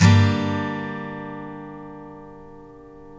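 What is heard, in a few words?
STL G Minor DN, Guitars steel chords; Spectrasonics; Hans Zimmer Guitars VOL-1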